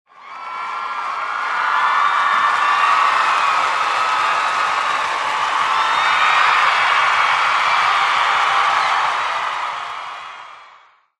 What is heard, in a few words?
crowd; Cross Racing Championship